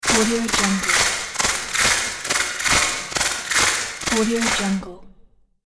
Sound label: future cyborg motion walk